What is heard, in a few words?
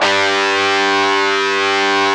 GTR FUZZ, Metal; Power Chds; Club-50 - Foundations